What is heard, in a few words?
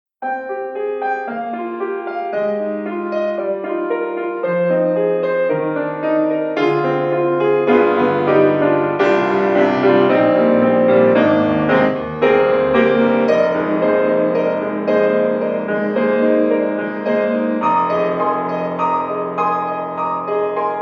PEARL CONCERT GRAND In the Hall; IMPACT SOUNDWORKS